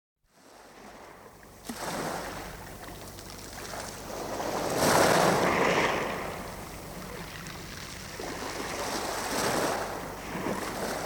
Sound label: waves